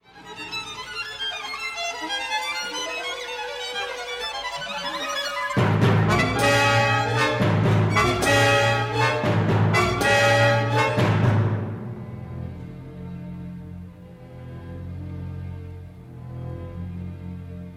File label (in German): Konzert fuer Violine Violoncello und Orchester 1. Satz; Cerha; Satie Einschuebe